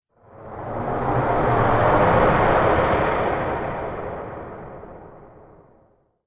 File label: Dark Storm Transition, Scary Soundscape